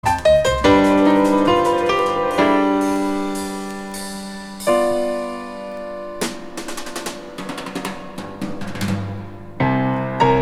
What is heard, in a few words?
solfeggio 600